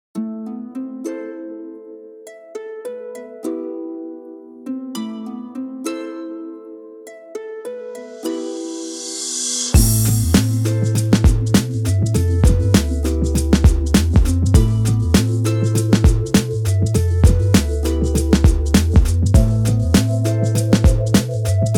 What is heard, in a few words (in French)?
harpege